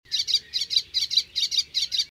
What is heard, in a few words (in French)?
Chant Hypolaïs Polyglotte, Partie 1